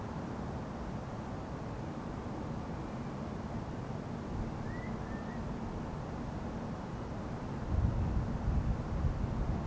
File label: Curlew sp, nocturnal flight call; Beijing